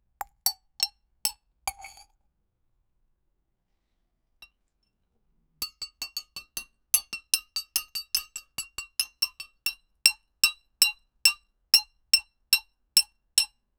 a man eats black caviar; dining

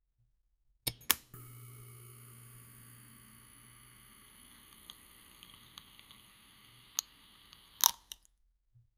the sound of a pepsi, beverage

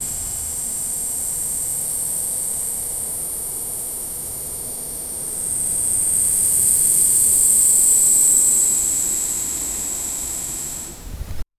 arrow whizzing through the air

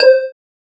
affirmative selection sound, bright

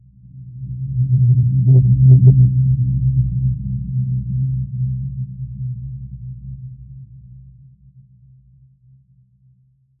low subterranean drone at

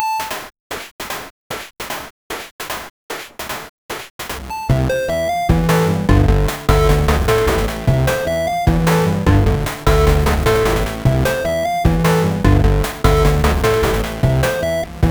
looping 8bit platformer b

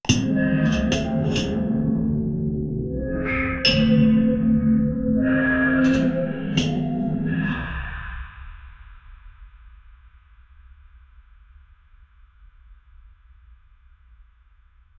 ambient gothic music

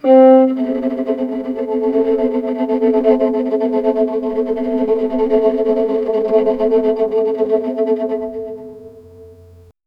low violin tremolo deep

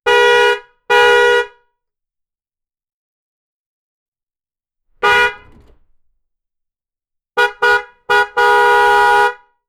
two extremely brief truck, horn

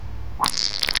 realistic sound of poopin, bathroom

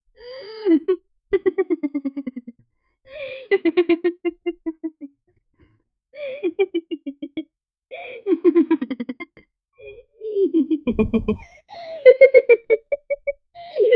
female meowstic laugh sof, amused; SFX Engine

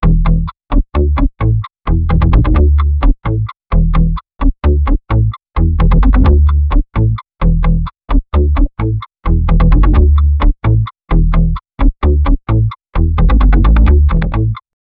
lo-fi clear cut bass

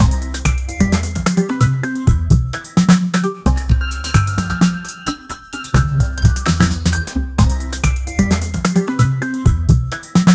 upbeat instrument drums

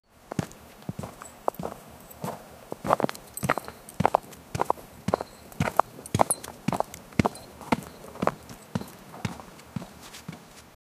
realistic sound of an old, desert

